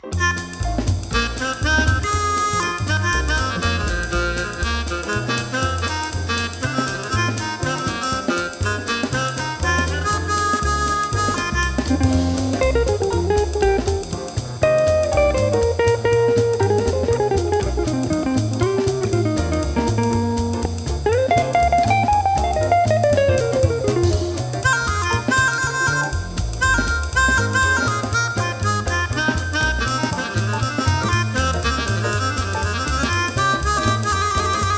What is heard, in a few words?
guitar